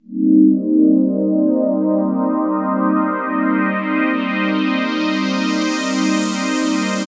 Flutter Away Cmin, Pads; Drum Bass; Zero-G; Total Drum Bass

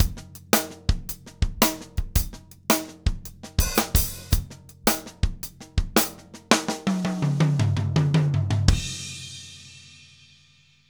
END TRIP, 165 TRIPLETS, HI BPM, Drumscores; Steve Gadd Drumscores

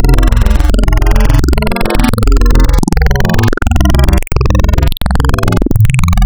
Spectral, wavetables; Architecture 32

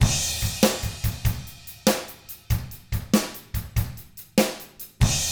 ROCK 6/8, Drums; ILIO; Double Platinum Drums 1

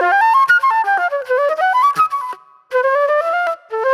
Jammy Flute; Sonic Foundry (Sony Creative Software); Manhattan Flutes; Super Brown

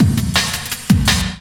Difference Break, breaks; m8 breaks

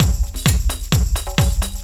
drum 130 tribal, drumloops

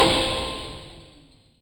Yamaha DTXpress Effect; 300 Drum Machines

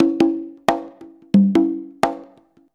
CONGA, Modern Percussion Loops

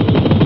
galop; Amiga Soundtracker Sample Packs

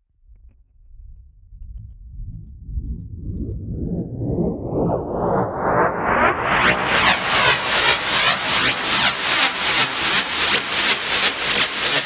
Rises; neuro; Optiv & BTK

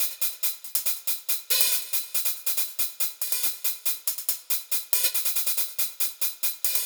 Hi-Hat Esoterica, Beats; Sonic Foundry (Sony Creative Software)

